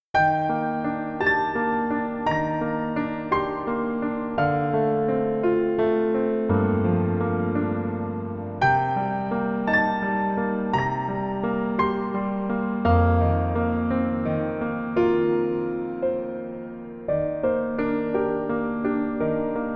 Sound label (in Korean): Piano ver 멜로디MR -1키